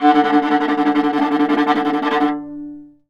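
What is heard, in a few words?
VIOLA tremolo D2; Orchestral; DirectWave; Varazdin Orchestral; Viola II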